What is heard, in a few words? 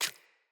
Root Spider Step Water, Foley; Animals